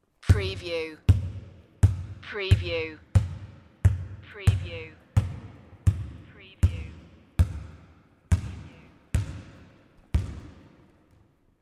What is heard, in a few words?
Basket Ball Gym